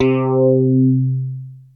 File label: Big Synth Basses